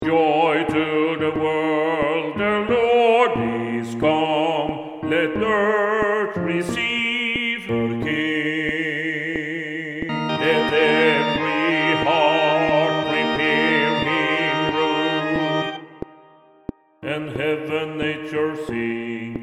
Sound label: bass click; Joy to the world